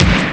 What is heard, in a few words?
Bass instrument; sonix; chipmusic